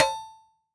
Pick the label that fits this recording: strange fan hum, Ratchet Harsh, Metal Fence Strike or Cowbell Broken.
Cowbell Broken